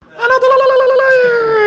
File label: lalalalalaai